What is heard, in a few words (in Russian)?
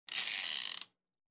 prokruchivajut baraban revolvera